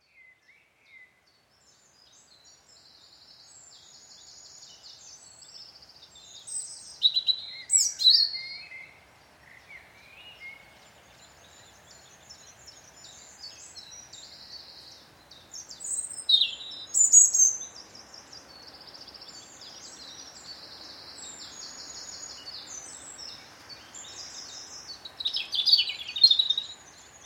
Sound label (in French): Sons d'oiseaux en forêt